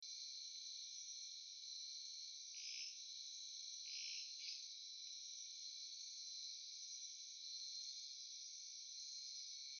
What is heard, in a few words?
Agalychnis dacnicolor, phyllomedusidae